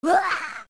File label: Bleh; Flare Dancer